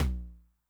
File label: Noise Low, 909 LOW TOM